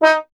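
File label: bass trombone D#; Miroslav Vitous; Solo Instruments VOL-1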